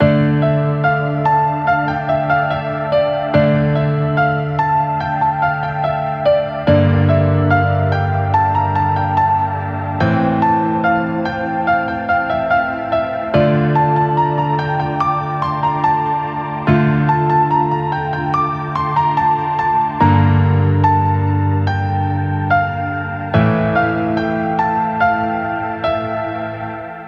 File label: RB Hip-Hop Melody Trappy 140BPM